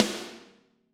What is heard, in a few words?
Snare Crack